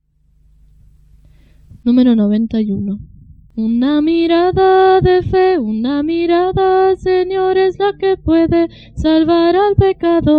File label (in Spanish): Coro, himnario niños